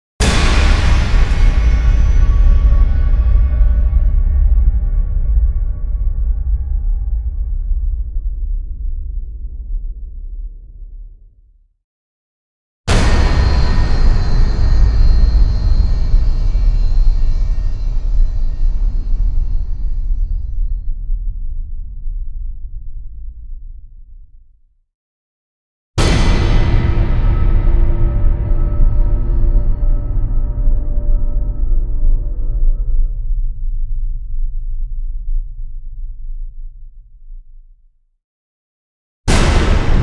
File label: Power Boom Impacts; Epic Tools vol. 1